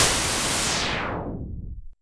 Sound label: Pulse, Filtered Noise